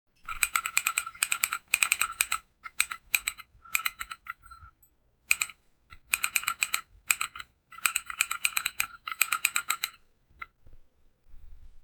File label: whip of a photo